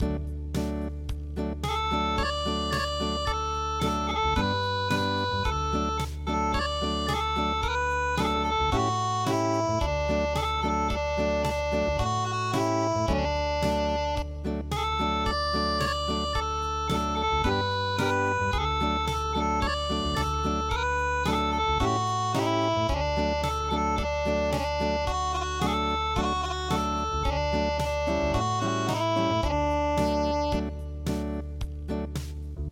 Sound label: Barnyard Song Unplugged